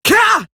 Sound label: pain, female